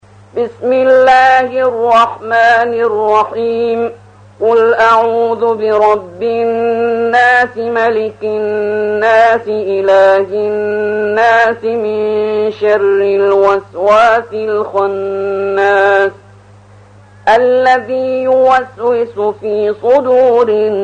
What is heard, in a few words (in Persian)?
ترتیل سوره ناس